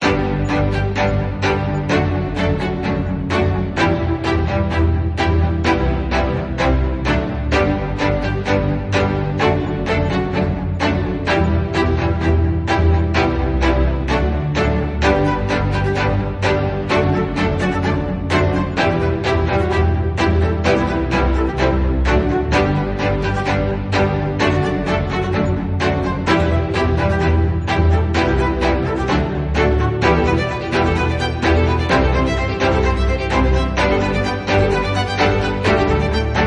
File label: classical